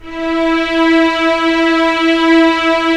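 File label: string section; Roland - LA Composer vol. 1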